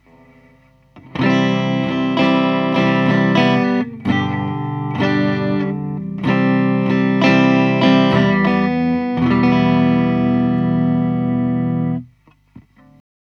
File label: BLA B12A SSL SiX Mic